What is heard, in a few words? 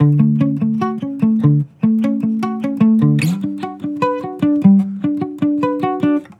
Acoustic Melody Loop 150 BPM D Maj; Cymatics